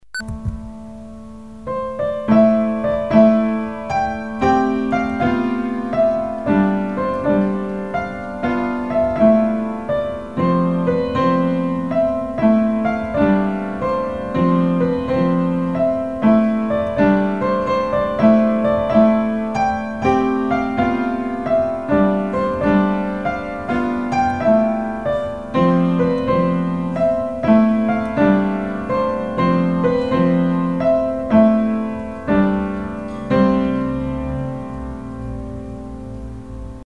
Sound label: piano